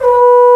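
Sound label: Trombone p B3; Digital Sound Factory Virtuoso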